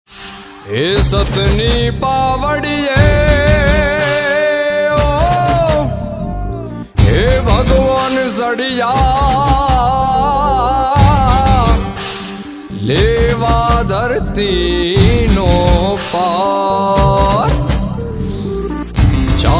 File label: gujarati ringtone